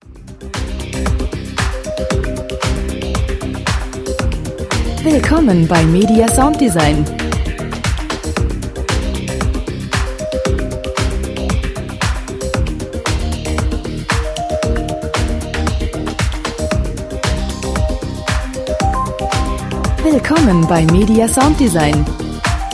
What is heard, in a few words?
percussion trancefloor